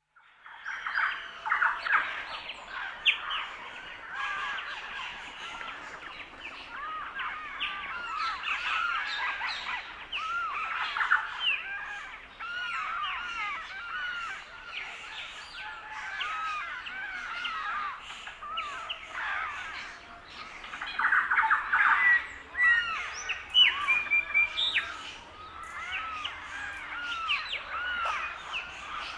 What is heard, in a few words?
Dawn chorus, Pantanal Swamp (central Brazil) busy with macaw, great kiskadee, parakeet and parrot; Outdoor; BBC